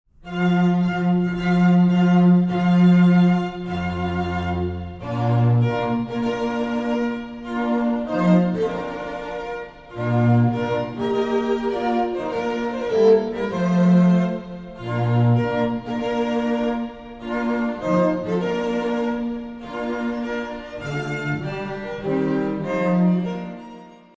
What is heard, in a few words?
wagner bridal chorus, strings